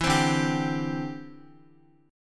D 7th Suspended 2nd Suspended 4th, Guitar, D7sus2sus4 chord; D7sus2sus4 strummed